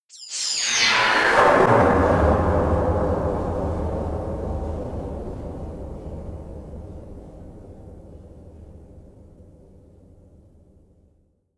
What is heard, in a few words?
cathedral noise drop